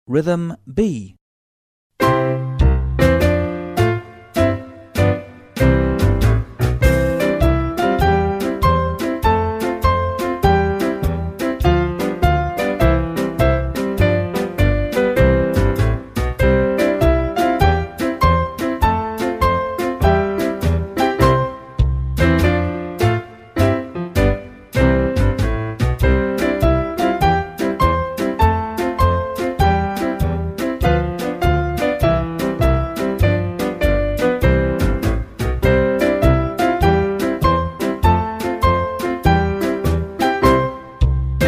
Rhythm B, tap

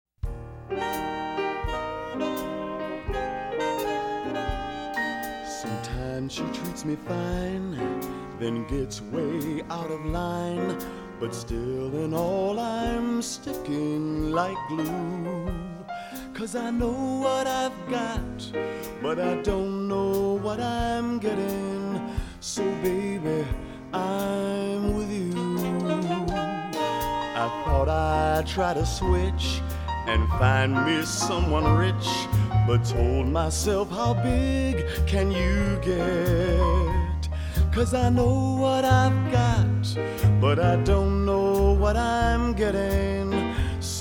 CLOSE WORK Swing Time, tap; tap intermediate

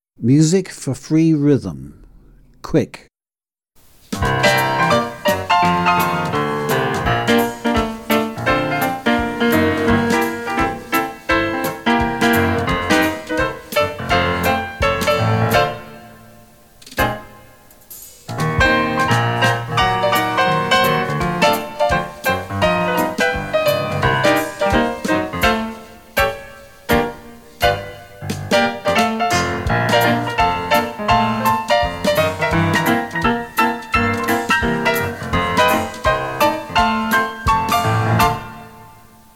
Music for free rhythm Quick, tap; tap advanced 1

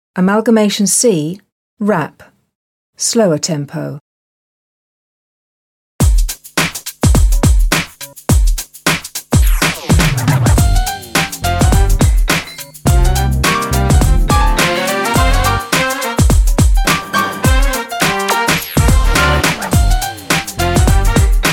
Rap slower tempo

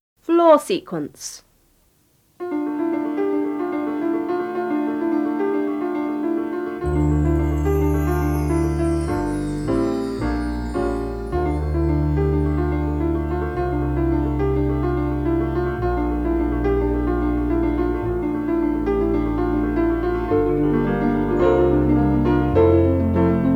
Int Floor Sequence, jazz; jazz intermediate foundation

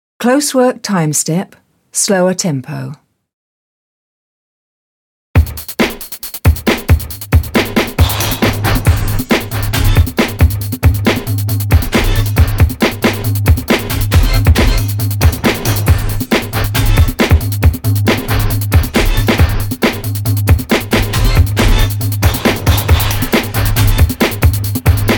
Closework Timestep slower tempo, tap

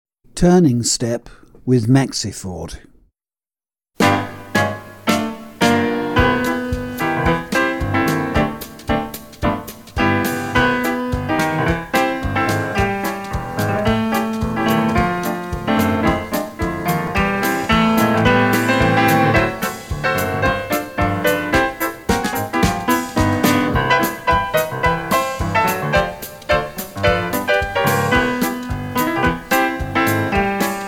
Turning step with maxi ford, tap

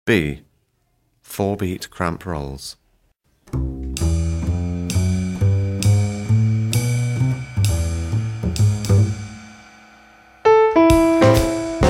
4 Beat Cramp Rolls, tap; tap grade 2

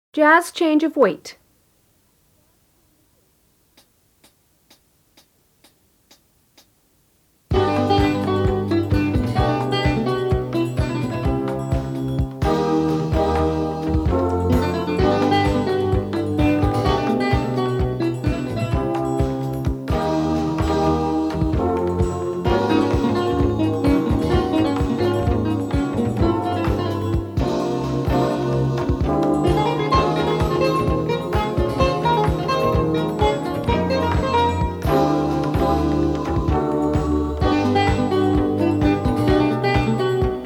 Int Jazz Change of Weight; jazz intermediate foundation

